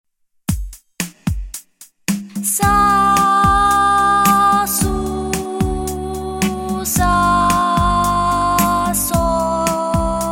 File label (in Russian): logopediceskie raspevki myzykalnaa skorogovorka